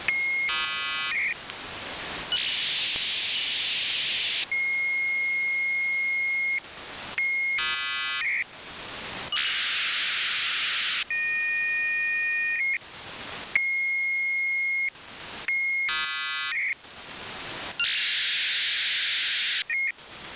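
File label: usb unknown signal on shortwave; 10346khz; neizvestnij signal na kv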